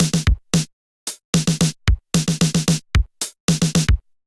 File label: 707 Kit groove 112 bpm, Drum; MIDI Clips; 111 - 130 bpm; 707 Rok With-U Kit groove; Ableton; Factory Packs; Drum Essentials